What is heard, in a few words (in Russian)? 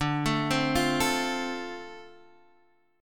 Perebor Dm7